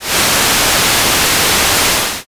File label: rain